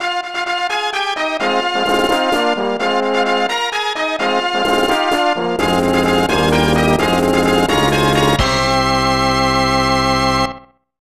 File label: Race Start Fanfare; derby jockey 2 1995 snes